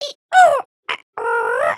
robot dance, emotes

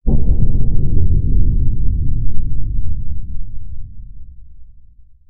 Monastery Thud; ninjago

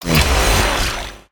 ripsaw

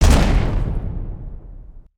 charge

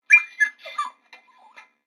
Pet Dog Robotic Idle; creatures; pets; Lego

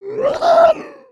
Attack; Skullkin; creatures organic

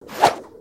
Frisbee Throw

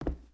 Foot Wood Boot Walk, footsteps

